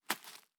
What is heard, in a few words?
Leaf Walk Surface, Footsteps